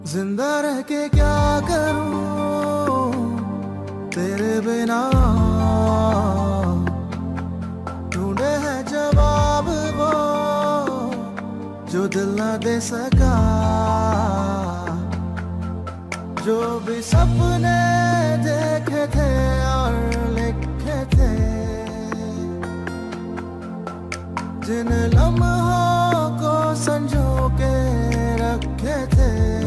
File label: Hindi Sad Ringtone; ringtones